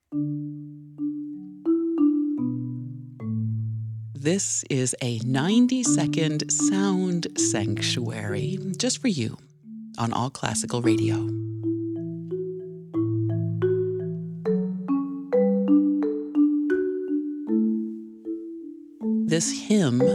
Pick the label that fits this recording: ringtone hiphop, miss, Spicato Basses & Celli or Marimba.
Marimba